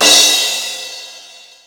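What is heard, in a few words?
Crash, Yamaha SY22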